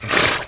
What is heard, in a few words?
Horse Snort